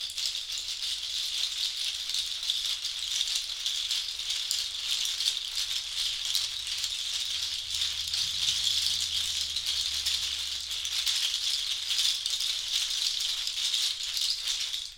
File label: Bean Belt Shaker